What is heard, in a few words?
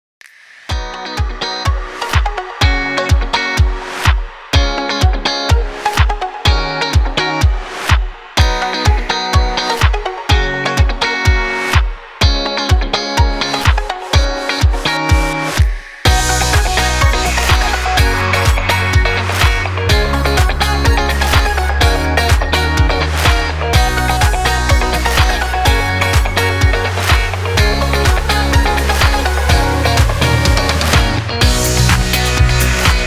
Summer Upbeat